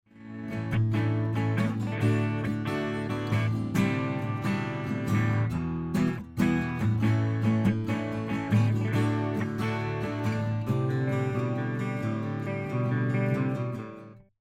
Kytary no HP; high pass filter

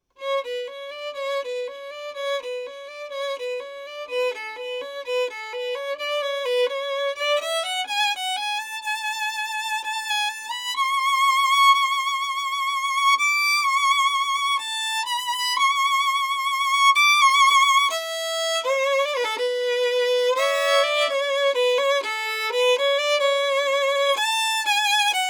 Housle; Recenze SE 5

